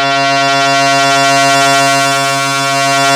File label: 2600 A#3, ARP 2600 Synthesizer; Hollywood Edge - Giorgio Moroder Rare Synthesizer Collection